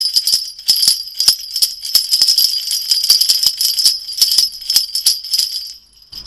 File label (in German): Glockenstab; Rohema; bunt